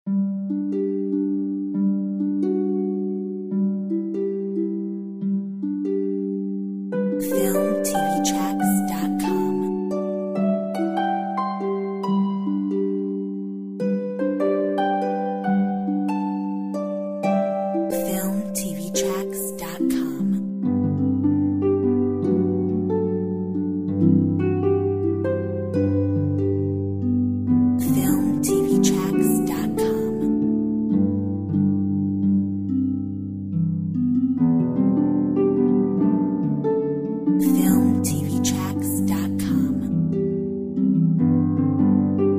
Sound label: peaceful heart Harp